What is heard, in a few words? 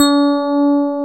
Digital E.Pianos, Roland; Digital EP's